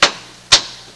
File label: switches; Sound Effects for Multimedia - Volume 1